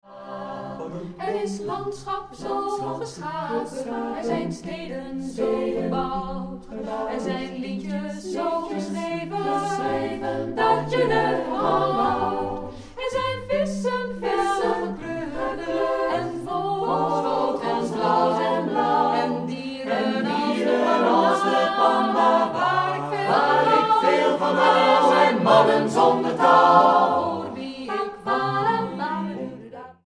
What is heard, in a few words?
ssatb